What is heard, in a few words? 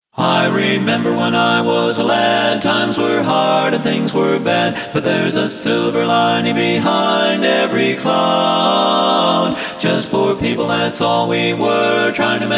Bass acapp; men